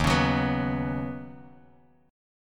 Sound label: Eb Minor 7th Sharp 5th Eb Bass Standard; strummed